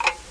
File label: clock